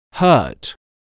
hurt

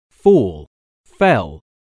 fall

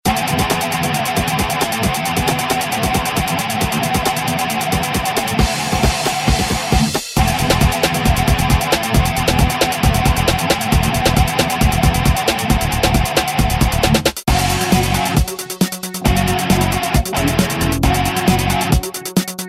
loop

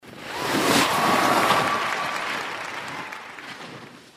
right jump

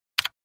click

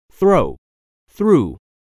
throw

